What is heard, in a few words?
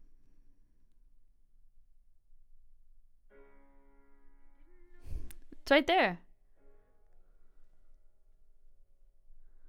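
vocals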